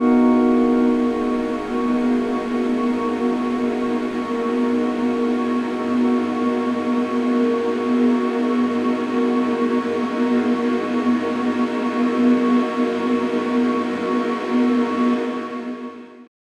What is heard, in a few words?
Pad dry